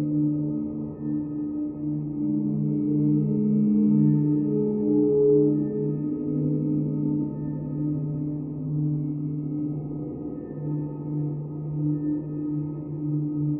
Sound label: cave default, music ambience; The Swapper